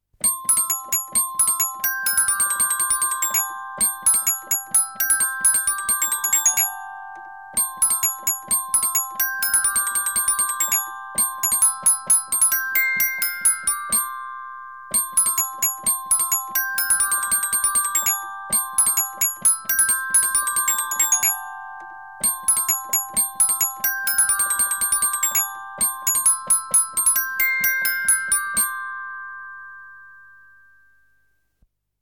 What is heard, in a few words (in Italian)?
CARILLON